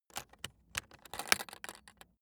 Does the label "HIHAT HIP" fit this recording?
No